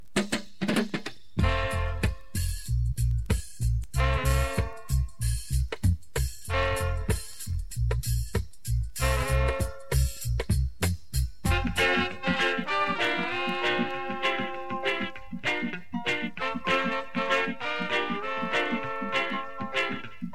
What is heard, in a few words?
Swinging Dub